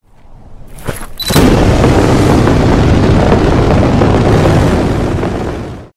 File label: jetpack